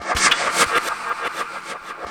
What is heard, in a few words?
FX REVERSE